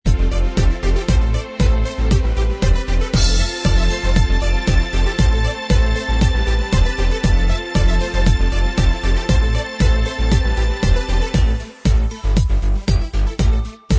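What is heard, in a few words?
instrumental, track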